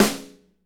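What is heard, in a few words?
snare, Motown; Northstar; Drumscapes Roland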